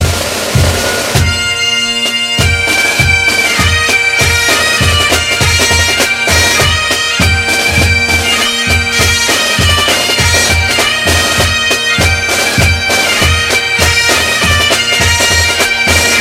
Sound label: Bagpipes